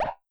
Toxic Error

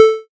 assign; lix

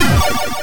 enemy kill; mazeofgalious

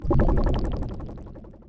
drown; opensurge

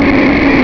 Off Road; stuntcar

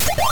jump tree; mazeofgalious

